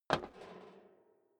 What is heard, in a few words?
step boots metal rattle single stair down; Footsteps; schritte